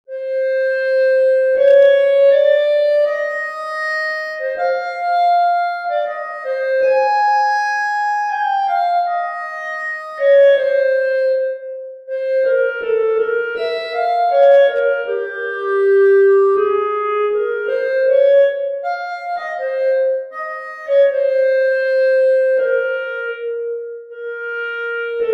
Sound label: clarinet